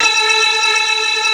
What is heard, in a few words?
A4, Keyboards Old School; AKAI; 90s sample CD; USB Soundscan vol.09